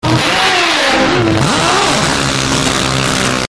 Drag Race Start

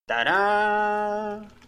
TaDa; Cardinal Copias

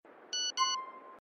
Text Tone; Gta Iv

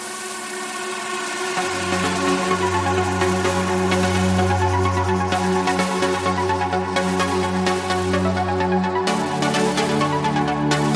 Electro Ringtone; meloboom

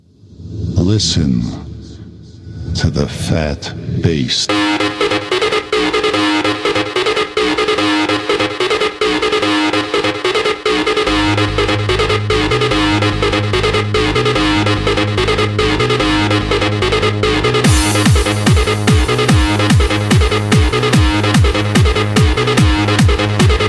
Phat Bass

